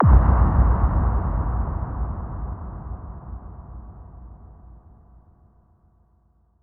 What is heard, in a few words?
Hits & Explode